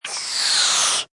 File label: Cartoon Inflating, Blow air, inflate balloon or other ob; Comedy/Cartoon; Cartoon Sound Design; Blow air, inflate balloon or other object